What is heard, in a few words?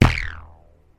Rubber Band Twang